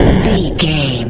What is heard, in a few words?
retro explosion deep